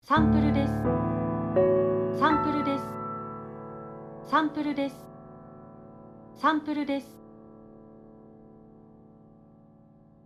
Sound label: Logo Piano slow